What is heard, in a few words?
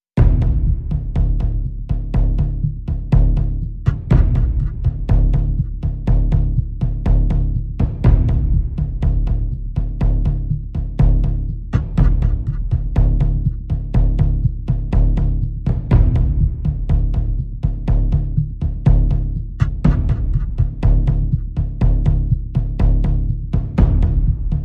Pulses Only